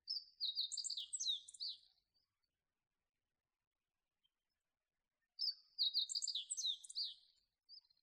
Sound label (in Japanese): hoojiro saezuri